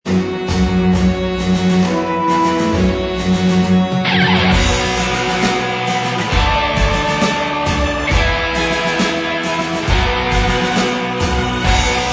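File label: Instrumental Track, backing tracks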